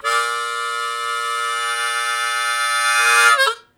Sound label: Blues Chord Attk Bb1, Harmonica; 90 s Sample CDs; Zero G